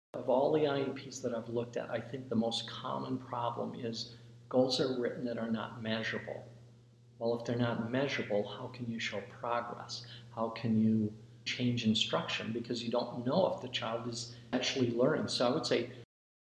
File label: yell